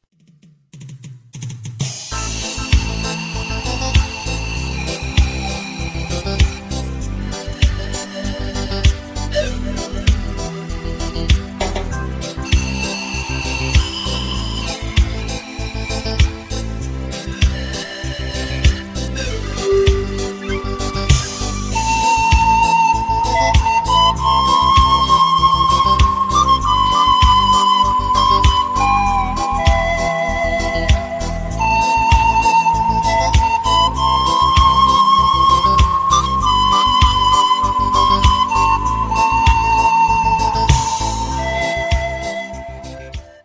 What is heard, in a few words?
song of ocarina